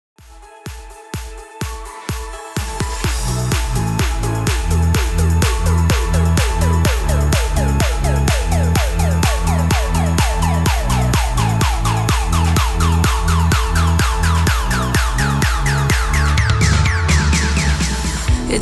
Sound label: progressive cut 126 bpm